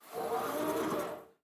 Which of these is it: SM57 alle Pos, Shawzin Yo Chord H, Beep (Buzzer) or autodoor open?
autodoor open